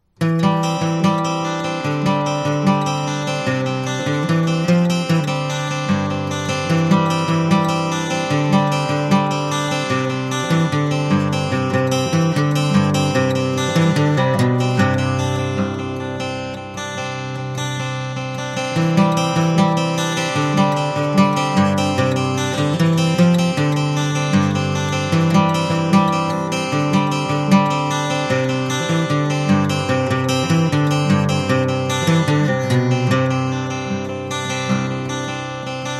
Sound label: synco, guitar